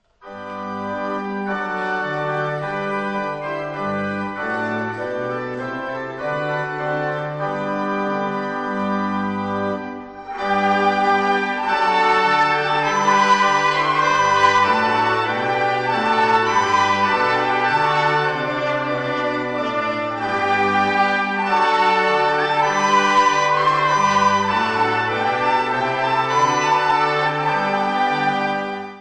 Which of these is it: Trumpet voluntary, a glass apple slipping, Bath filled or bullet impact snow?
Trumpet voluntary